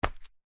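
foley sandal flip flop single hard footstep on hard ground; zapsplat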